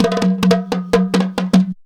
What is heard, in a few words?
JUNKU RIFFS; Best Service; World Colours